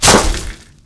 bush; Mafie 1

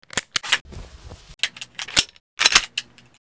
colt; Mafie 1

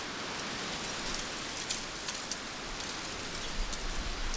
tree; Mafie 1

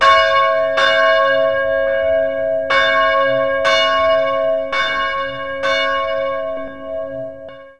bell; Mafie 1

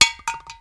metal; Mafie 1

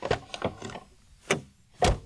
car door; Mafie 1

